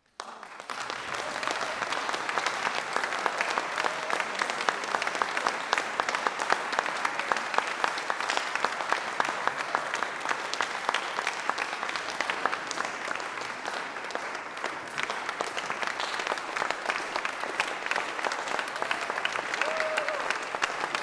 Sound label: applause; meijer concert 2014